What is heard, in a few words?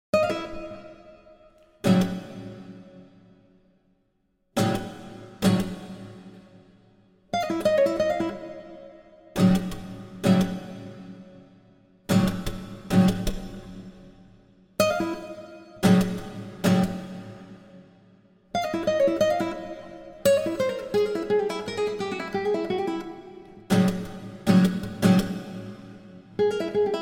five pieces for guitar with live electronics, guitar with live electronics; III. brunete